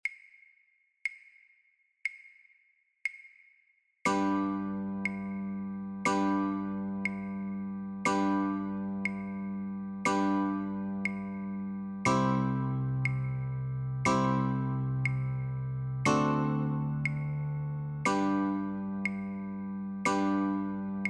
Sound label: Chords only, Guitar